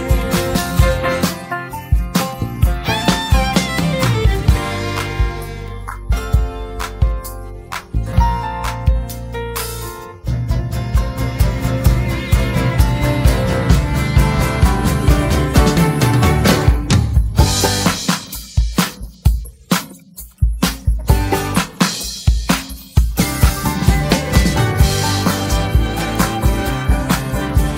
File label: Accompaniment Only